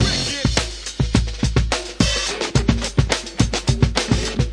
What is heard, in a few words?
broken break, 106 bpm, drum loop; 101 to 110 bpm